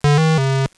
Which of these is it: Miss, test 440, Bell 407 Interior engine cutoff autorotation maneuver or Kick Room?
Miss